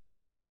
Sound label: marimba